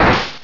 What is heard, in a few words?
Flare Blitz; pokerogue; battle anims